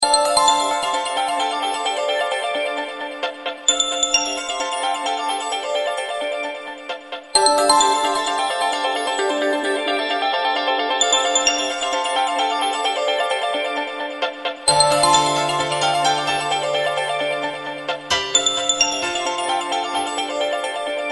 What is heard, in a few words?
diamondring guitar